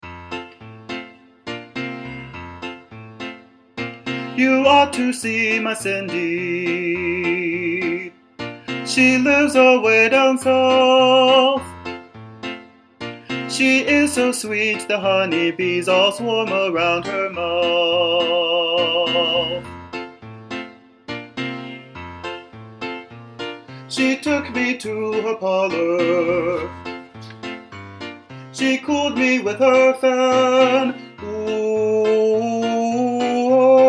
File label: baritone